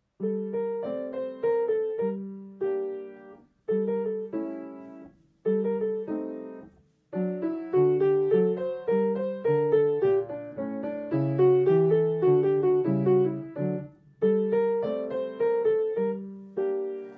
spanish dance

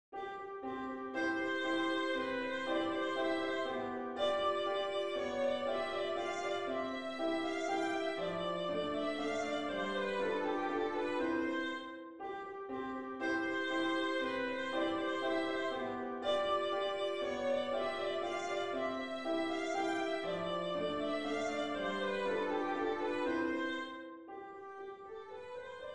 dance allemande; beethoven ludwig van

